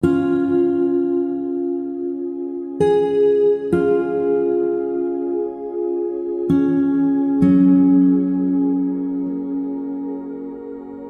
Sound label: Acoustic Guitar III; Storytelling-Essentials